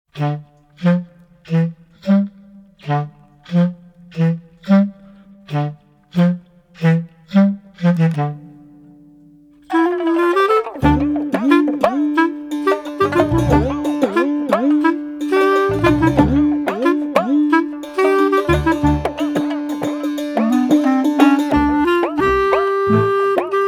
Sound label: Clarinet Theme; Gulabo Sitabo